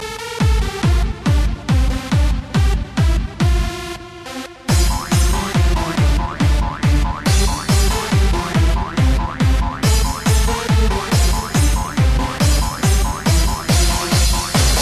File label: christmas tecno